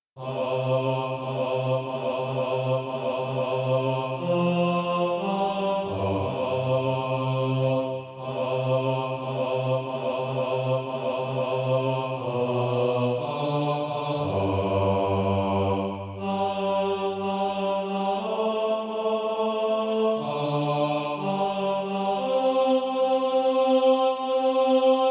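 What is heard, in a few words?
bass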